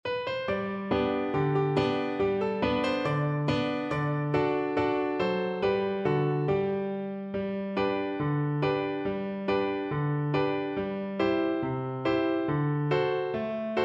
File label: this old man, Flute